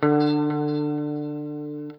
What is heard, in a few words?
NOODLE LONG, Guitar & Bass